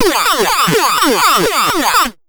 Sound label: Sound FX Trap Synth